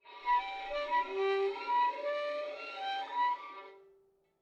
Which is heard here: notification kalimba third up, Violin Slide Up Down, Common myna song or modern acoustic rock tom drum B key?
Violin Slide Up Down